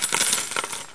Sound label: Drop Money